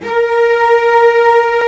Cellos; CMI

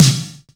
Snares; CMI

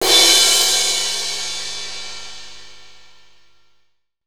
Kit Heavy; CMI

